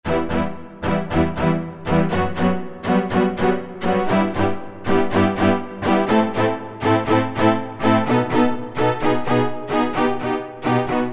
Str Sect, Roland MT-32

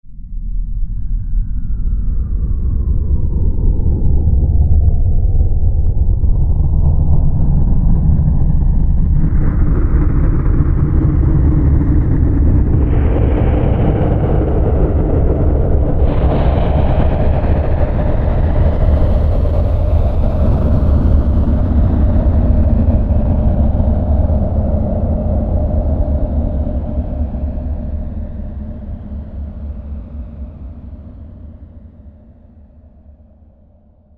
Sandstorm, Roland SR-JV80-15 Special FX; Roland SR-JV80-15 Special FX Collection

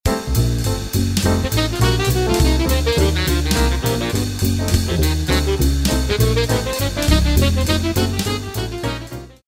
Sax Cutting Section; Combinator; Propellerhead Reason 3.0

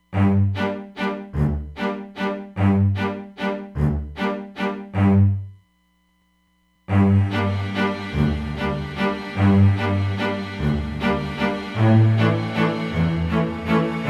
Plate, Roland DEP-5; Factory Patches

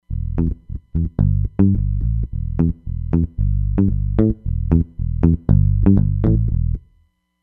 Comp Picker, Roland Fantom XR

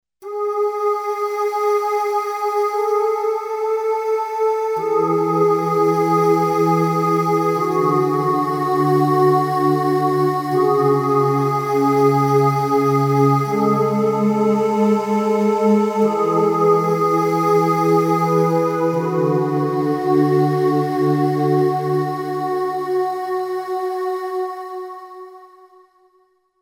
Dream Voices

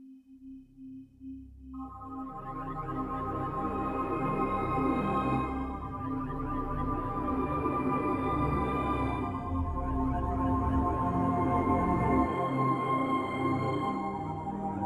Waterspace superlow; Spacey Keys

